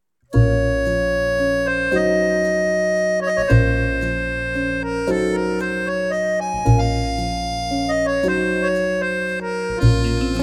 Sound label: Fleeting Moments, Parisian Cafe Culture, nostalgic french lounge jazz